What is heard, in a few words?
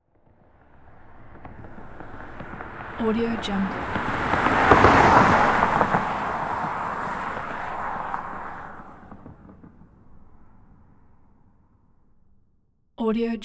car pass by